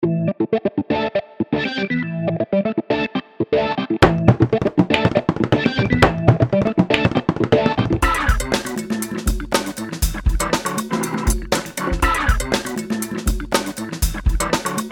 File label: Music loop